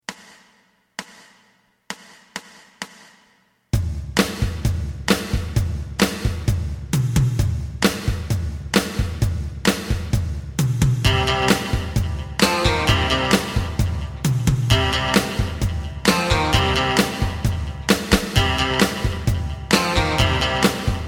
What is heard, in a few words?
bass